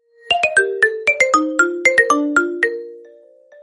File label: caller tune